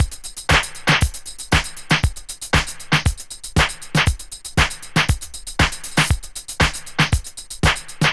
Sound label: disco, Drum loops